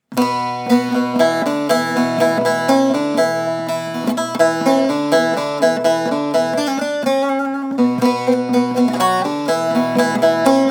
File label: Saz Baglama